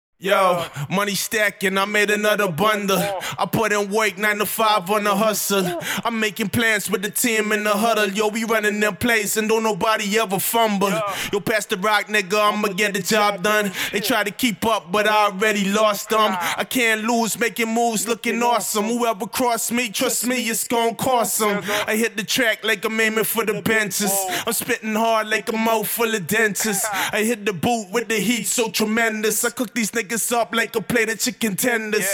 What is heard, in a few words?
acapella, HH 182 Bbm